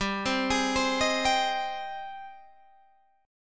Ab Major 7th, chord

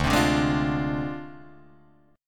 D Minor 7th Flat 5th Guitar Drop D

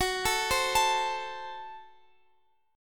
Gb Diminished, down, Guitar, Open F

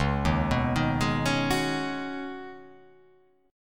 Db 7th Suspended 2nd Suspended 4th; JGuitar

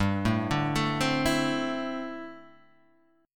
D 7th Suspended 2nd Suspended 4th, G

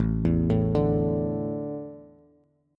A Suspended 4th, Bass; Asus4 arpeggiated; JGuitar

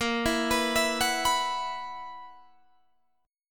Gb 11th Bb

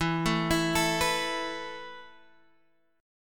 E Suspended 4th E, down, Guitar, Half Step Down

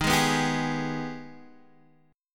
Ab Minor Major 7th

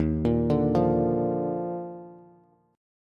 Eb Major 7th Suspended 2nd, Bass; arpeggiated; chord; JGuitar

